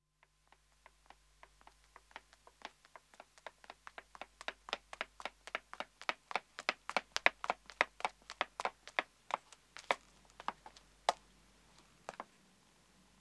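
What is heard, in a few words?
Pavement Running 1 woman approach; Footsteps; BBC Sound Effects Library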